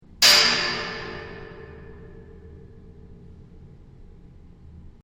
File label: Metal on railing